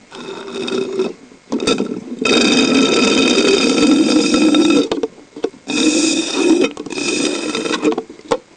Tieng uong nuoc qua ong hut hut ong hut

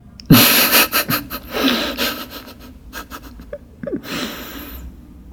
Tieng ngam mieng cuoi khuc khich giong nam

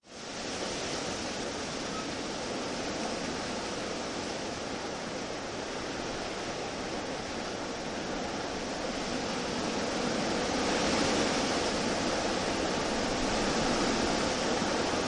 Tieng la roi mua thu

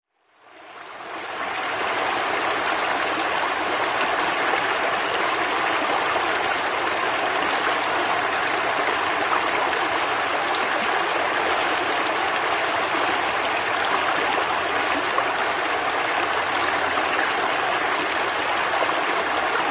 Tieng nuoc chay am am o suoi

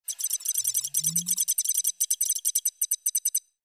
Âm thanh tải dữ liệu kỹ thuật số của robot

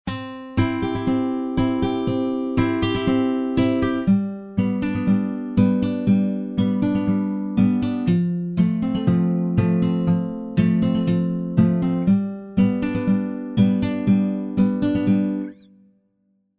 Nhạc nền video ghi ta lãng mạn nhẹ nhàng

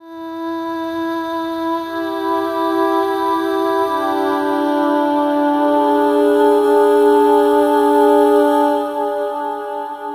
Tiếng giọng người phụ nữ kinh dị vang vọng

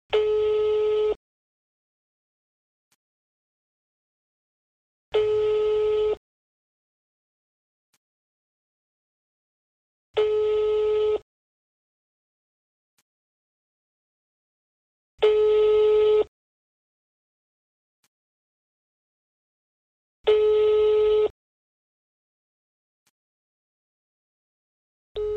tieng chuong dang noi may dien thoai tut tut tut

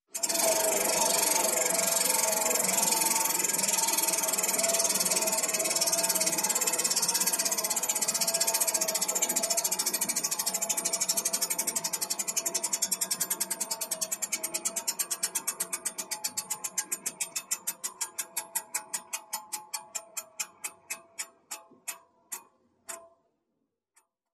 tieng vong xoay may man quay manh hon

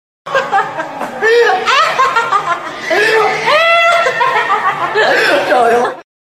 am thanh meme cuoi a ahhahahha; ban goc

